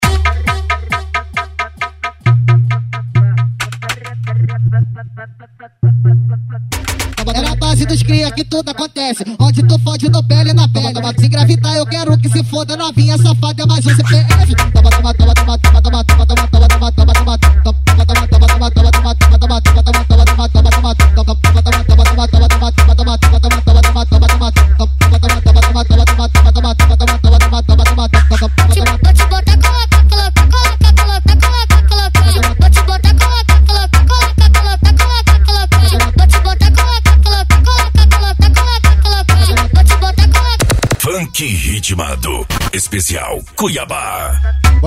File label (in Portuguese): funk ritmado; especial cuiaba